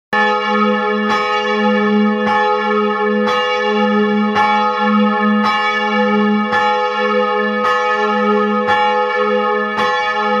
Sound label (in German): Maria Magdalena Glocke, glockenklang; Jesuitenkirche Innsbruck